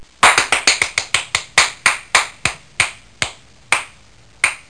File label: Single Hand Clap; Soundsation; Human